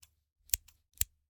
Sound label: Scissors, snap, snip, shear, medium, double; Eiravaein Works; Vaeyan IV